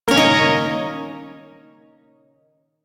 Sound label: Yo Chord K; Warframe; Duviri Shawzin